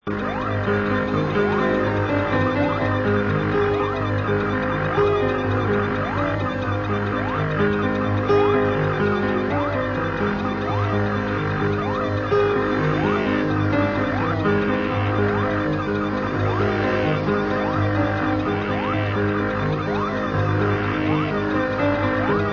Piano Works

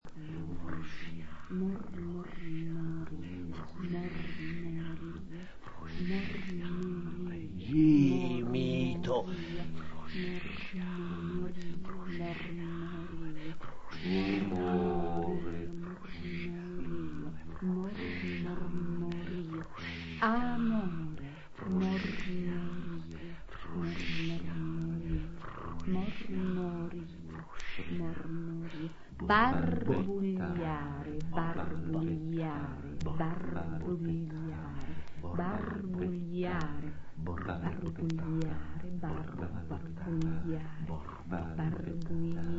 Trio

